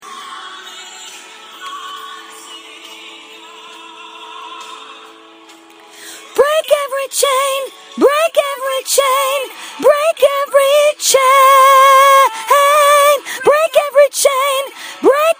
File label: 3rd above